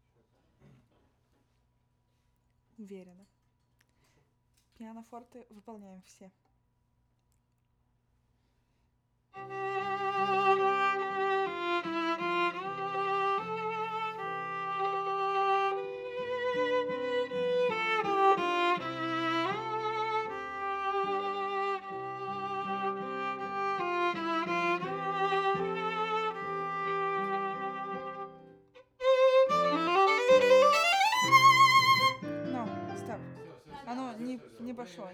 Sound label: Viola C